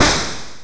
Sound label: crack